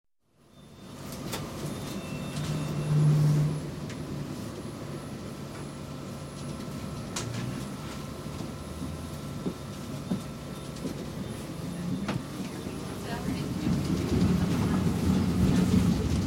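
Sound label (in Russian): проезжающий трамвай восприятие из салона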